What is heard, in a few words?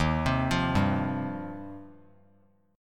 D sharp 6th Add 9th, D sharp, down; arpeggiated; JGuitar